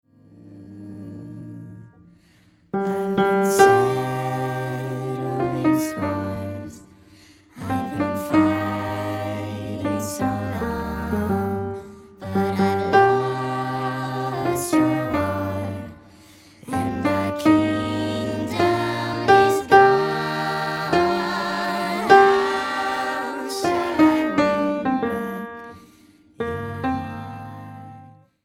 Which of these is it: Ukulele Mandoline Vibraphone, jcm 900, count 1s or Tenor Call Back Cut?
Tenor Call Back Cut